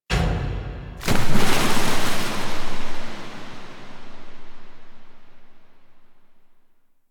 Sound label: Queen Scepter Hit; The War Within; Mirror